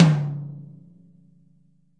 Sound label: thin tom, Multisampled Real Kit; REAL LIVE KITS